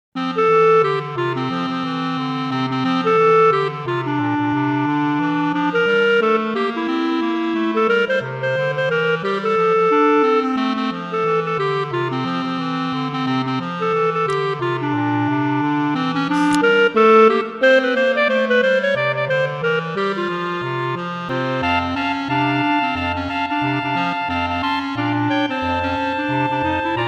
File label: Jazz Christmas Song Jingle Bells; Clarinet Quartet; Pierpont, J.